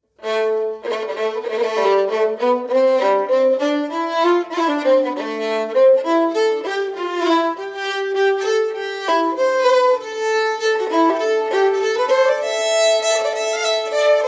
Return to Camden Town Reel

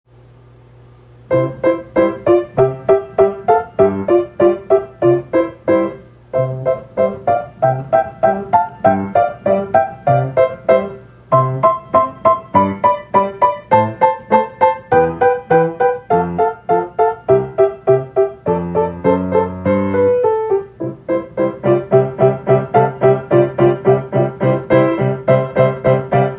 Étude on sixths

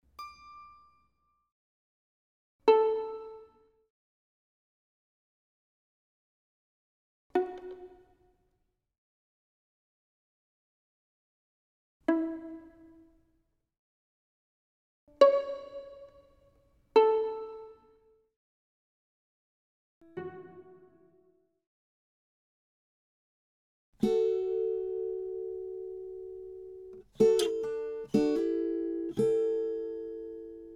Mirror Traveling Guitar; Griefwalker Documentary Soundtrack

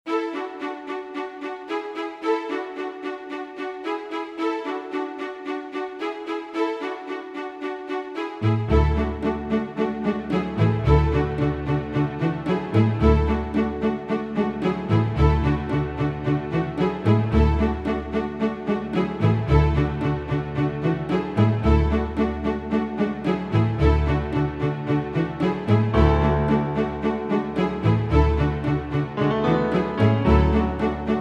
Corporate

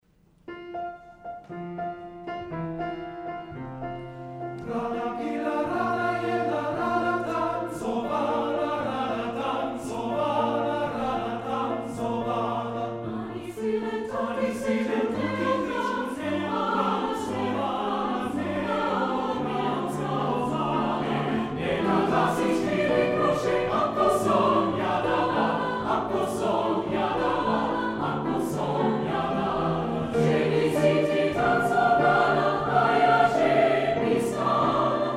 Four Slovak Folk Songs Dancing Song; Bartok

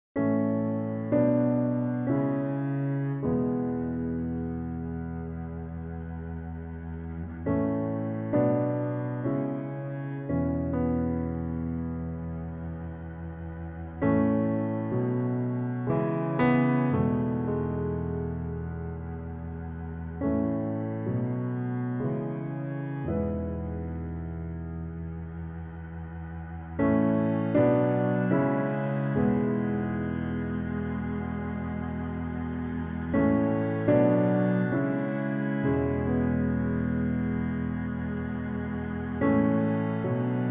Sad Cue trio mix

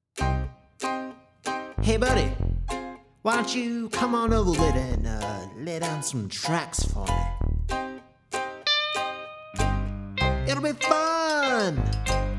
Pop Vocal

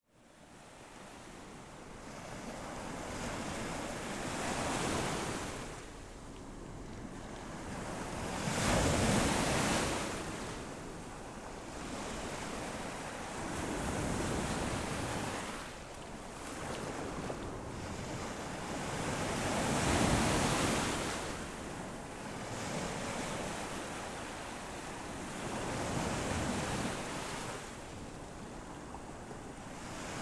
Gentle Waves at Presque Isle

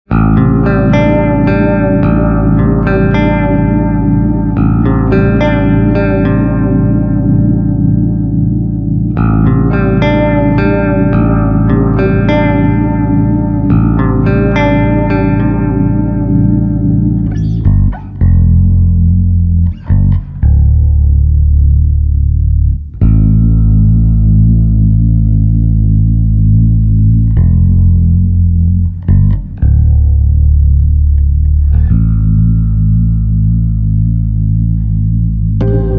Fender Bass Vibrations

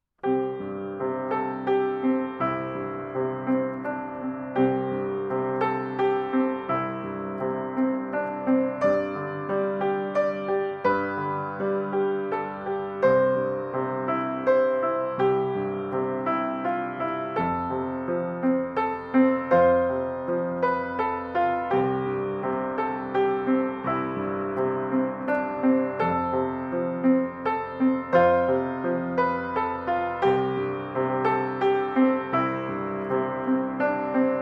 silent night piano

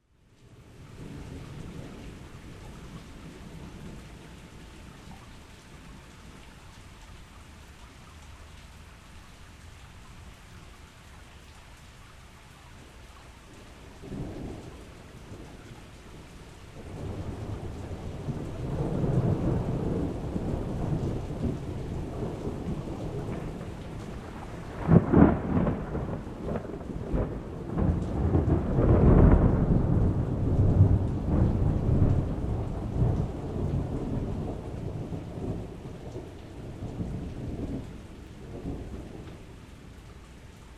Summer Thunderstorm in Youngstown

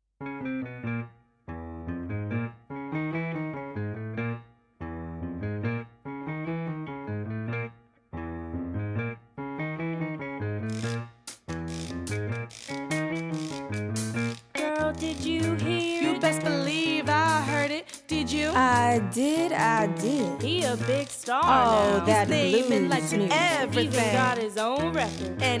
Gossip Chorus

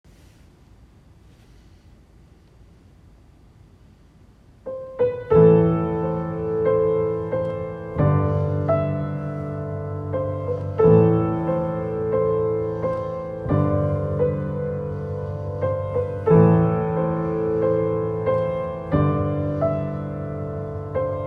Piano

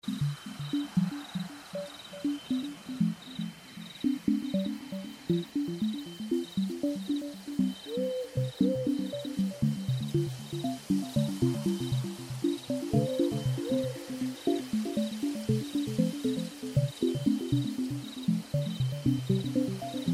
Fairy Nocturne 6144Hz

